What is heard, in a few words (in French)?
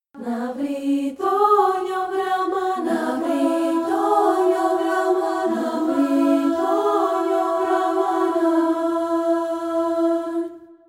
entree en canon; Les voix entrent en canon puis tiennent la note finale (note pédale); Entrées en canon, 3 voix